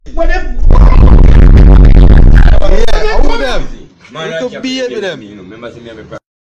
bass

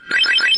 autodarts triple sound

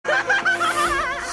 hahahaha

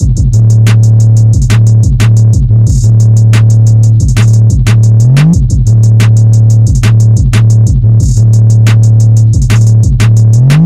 loud phonk

